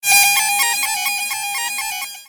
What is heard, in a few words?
Fakir Flute